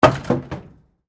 Bowling Pin, Knock, Over, Single, Close, Perspective; Sports; Bowling Sound Effects